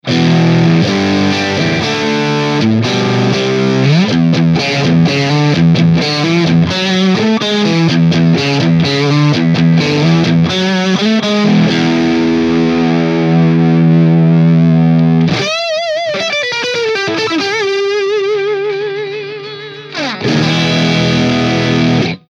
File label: Marshall strato